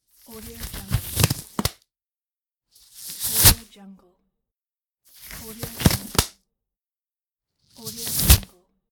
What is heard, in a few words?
rubber gloves